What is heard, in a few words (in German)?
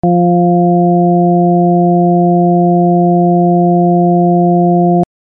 Synthese F3 Summe der vier, Saxophon